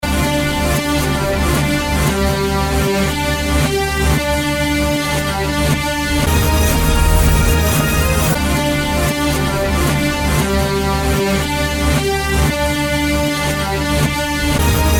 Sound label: REVERSED; guessing game №2